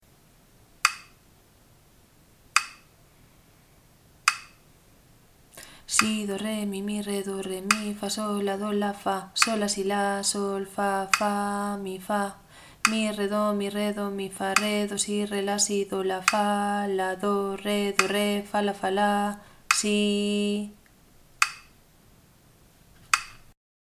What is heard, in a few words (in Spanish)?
ritmo 6-4